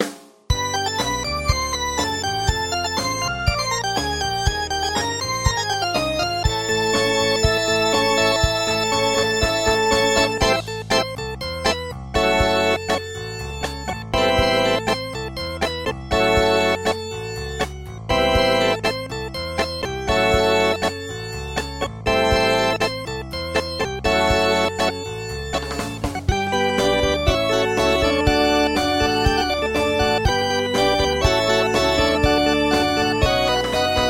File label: vox continental transistor